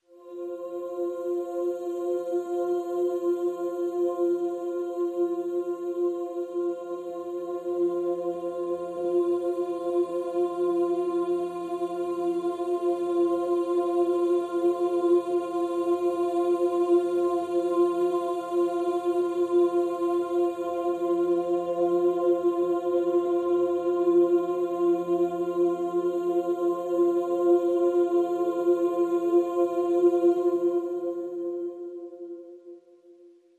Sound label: Pads Vocalicious; (F)